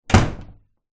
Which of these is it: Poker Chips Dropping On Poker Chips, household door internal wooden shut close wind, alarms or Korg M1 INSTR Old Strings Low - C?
household door internal wooden shut close wind